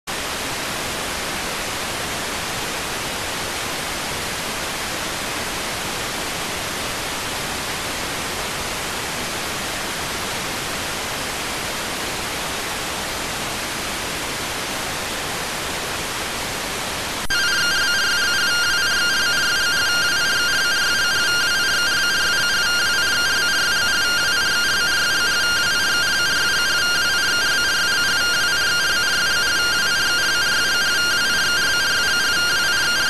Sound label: rtty rx